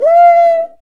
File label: CUICA, ETHNIC PERC; Roland LA